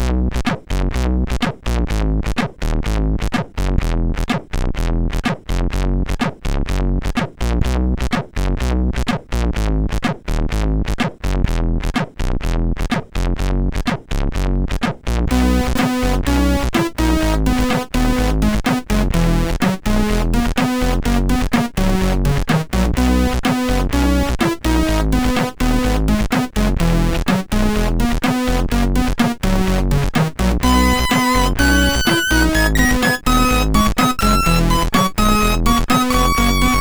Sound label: Intro tune, MOS6581R4; Sidewinder